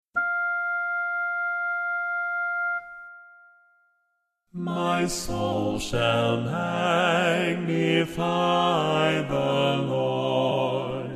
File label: magnificat bass